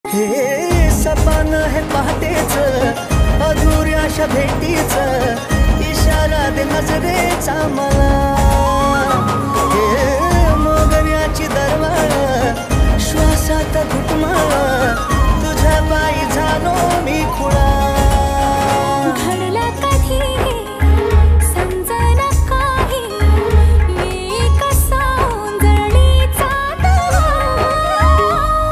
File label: marathi ringtone